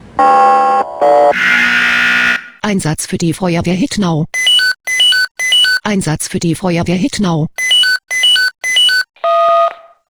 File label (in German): SMS Alarmierungstone